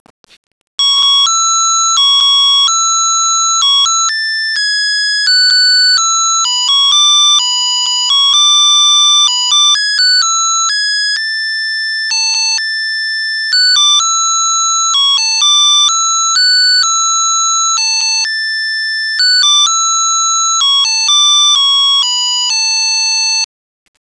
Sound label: Brahms lullaby, Melody song